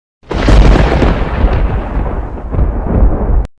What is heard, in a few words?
Thunder Strike